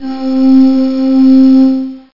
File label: vocal ooo; OctaMED SoundStudio V1; jazzies samples